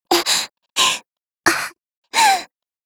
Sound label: hk416 bar chew